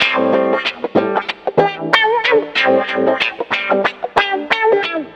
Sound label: ELECTRO HIP-HOP